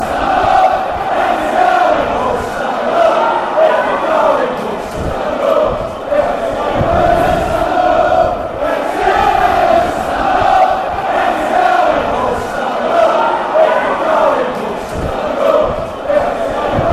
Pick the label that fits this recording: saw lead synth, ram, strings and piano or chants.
chants